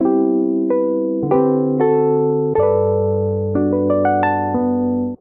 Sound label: Progression Block Chords Arp Gliss ii - V - I G Major 92 bpm, Electric Keyboards; Ableton; Factory Packs